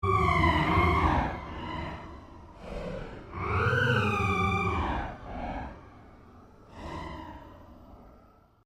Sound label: Created Cyber Whale Call, Alien Sound Effects